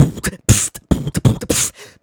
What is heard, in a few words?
Beatbox Loops, Human Beatbox